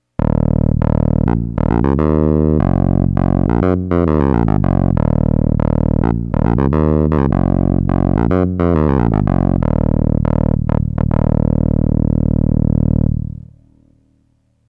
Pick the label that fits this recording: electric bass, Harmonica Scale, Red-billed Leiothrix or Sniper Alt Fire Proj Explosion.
electric bass